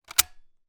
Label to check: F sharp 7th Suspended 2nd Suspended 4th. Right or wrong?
Wrong